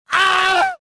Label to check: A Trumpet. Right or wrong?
Wrong